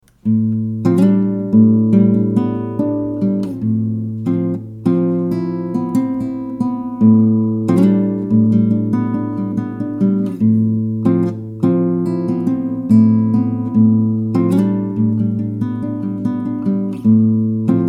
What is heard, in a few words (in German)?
Akustikgitarre mit AKG Perc.200 Zoom Delay